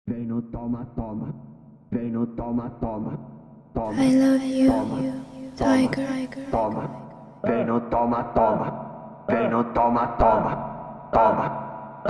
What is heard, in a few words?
Brazilian Phonk